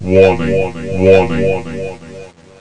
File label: warning snd; Woden1.3